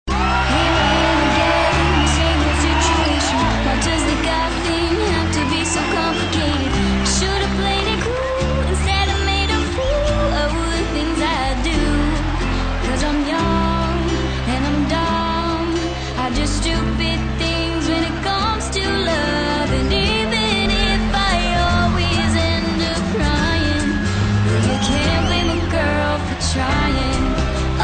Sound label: music jam concert